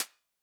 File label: hats C, hip-hop drum kit; 99Sounds x Monosounds; Moon